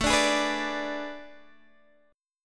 A Major 7th Suspended 2nd Suspended 4th, Guitar, DADGAD; chord